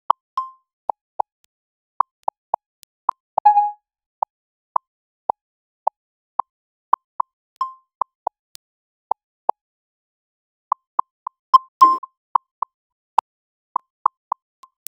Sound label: digital pop ups, notification